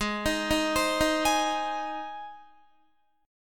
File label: Ab Major Ab down Guitar; Ab arpeggiated